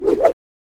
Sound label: spin whoosh